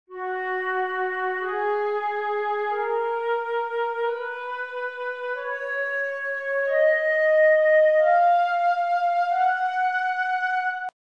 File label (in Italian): fa diesis maggiore, scale